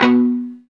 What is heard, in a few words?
GTR Chunk, Funk; Club-50 - Foundations; Funk & Mute